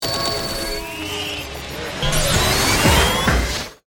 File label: Stinger Phone Rings, Production, Element, Stinger, Phone, Ring, Digital; Stingers; BlastWave